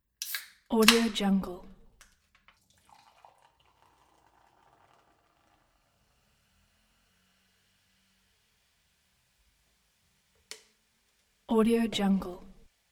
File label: fizzy can of cider or beer opened and poured